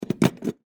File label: Monophone Down, Technology, Telephone, Monophone, Receiver, Hang, Up, Antique